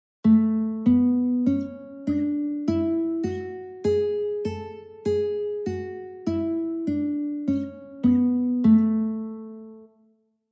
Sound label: A Scale Guitar; California Music Conservatory